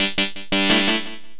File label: SYN LP, 170 BPM; Ueberschall; Drum & Bass Flash Trackz